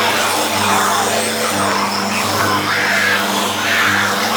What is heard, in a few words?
Moon Power Drain Loop; Warframe; Orokin Moon; Ambience